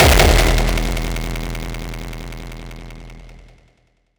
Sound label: impact oneshot; GhostHack